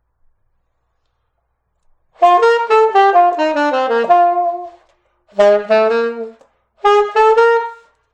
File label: sax